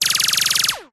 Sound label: science fiction weapon gun silenced multiple shots fast automatic